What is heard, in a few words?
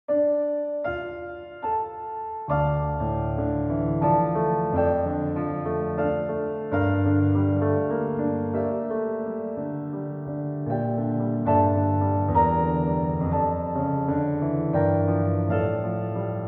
Ukrainian Theme and Variations